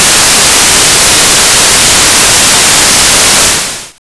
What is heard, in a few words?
WHT NOISE